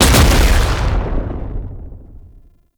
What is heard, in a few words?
Underground Attack Impact; Enemies; Abilities; Warframe; Proto Infested Babau